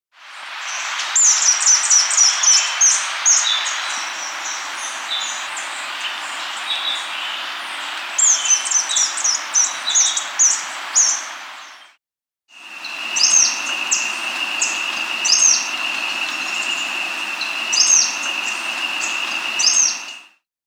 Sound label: Variegated Bristle-Tyrant (Phyllosca, S. America